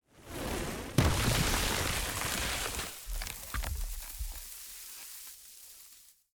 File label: Tree Thump; audiopostproduction